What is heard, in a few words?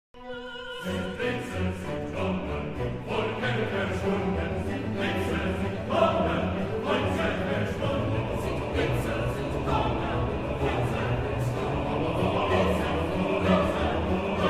Chorus Sind Blitze sind Donner; Colorado Bach Ensemble; St Matthew Passion